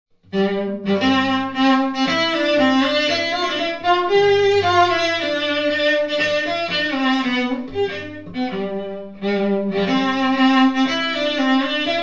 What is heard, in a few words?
cello classroom; reverb demo